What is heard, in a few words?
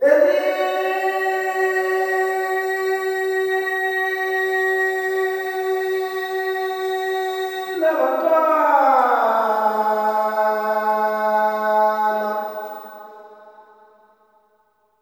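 MALE MOURN, MALE CALLS; Spectrasonics; Heart of Africa 2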